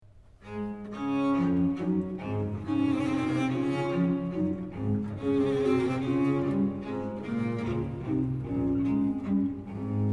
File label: Bass, Chorus; Mein Teurer Heiland; Jesu der Du warest tot